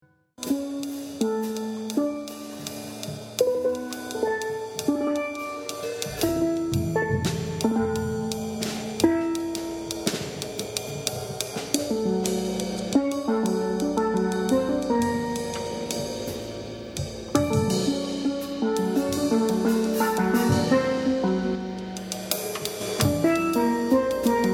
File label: steel pans